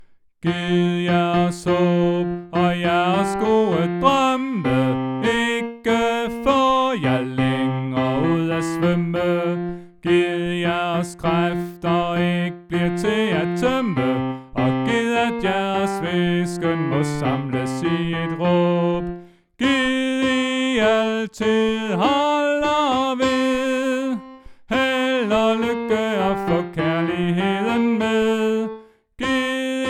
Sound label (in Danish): tenor